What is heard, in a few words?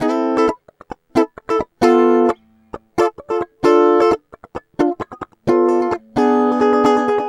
guitar, stringed instruments